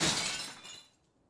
Carro distrutto; BLIND QUEST - The Enchanted Castle

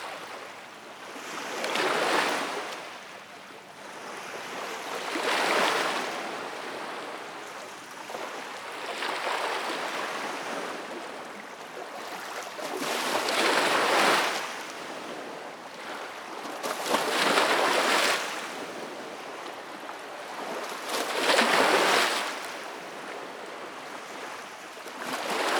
onde su riva; Inquisitor 2

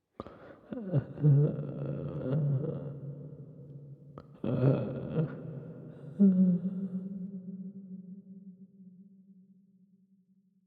lamento uomo; Inquisitor 3

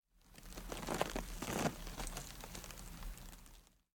Braciere; BLIND QUEST - The Enchanted Castle

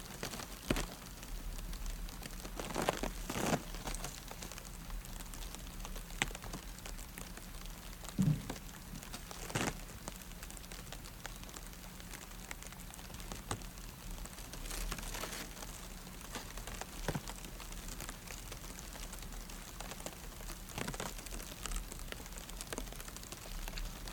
brace; Inquisitor 2

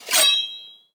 Lama

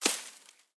Palme; BLIND QUEST - The Enchanted Castle

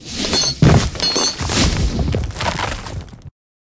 Legno Metallo In Volo

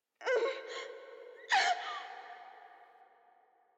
lamento donna; Inquisitor 3

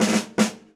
Archibugiere frediano; BLIND QUEST - The Enchanted Castle

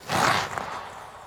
Artigli Enormi

